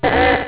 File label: illegal